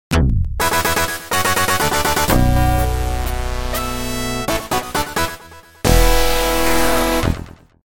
All Stage Clear; Contra

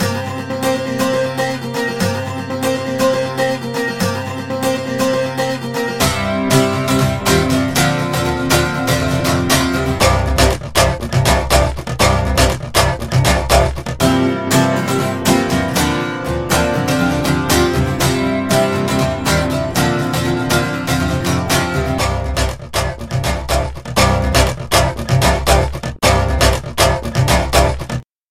Light Guitar Tone

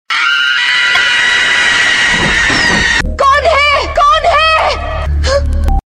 Horor

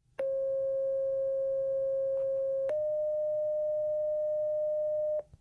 Fire Tone

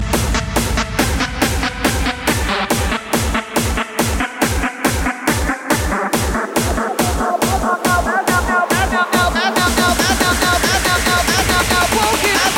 Funky Tune

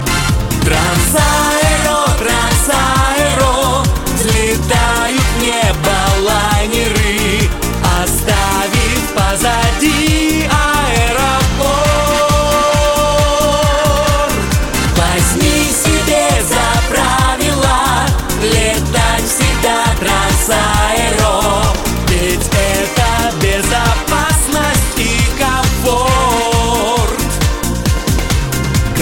Transaero Airlines

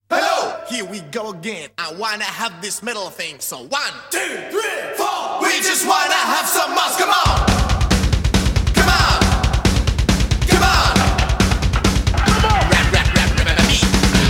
Rap Your Body Beat

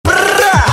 Bambam brrrrraaa

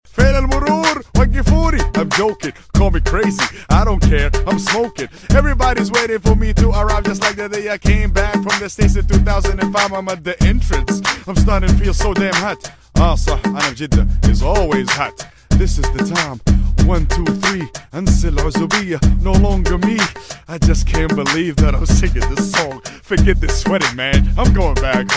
Wedding Saudi

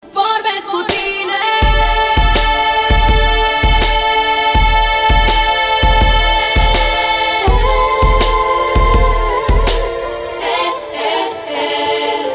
Msg Sad

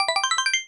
Jingle; Social Link

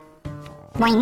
Bingo Msg Funny

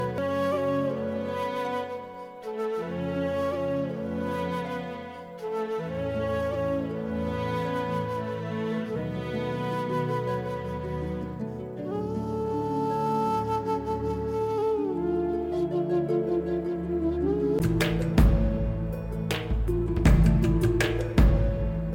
Sad Tone

